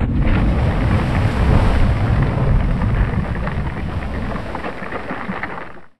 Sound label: Kraken Hit